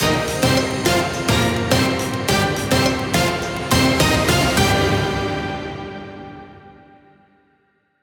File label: Transition